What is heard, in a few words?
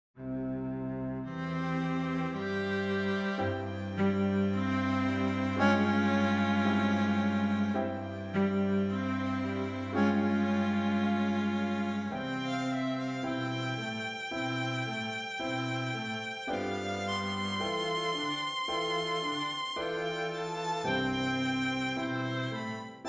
Strings